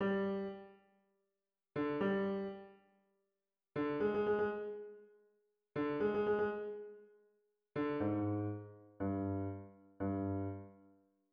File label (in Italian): Linea di Basso